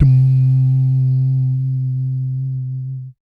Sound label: BASS DUMS; Spectrasonics; Vocal Planet; Jazz FX